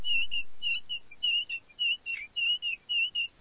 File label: tricycle fast; vehicles; gmod; tdmcars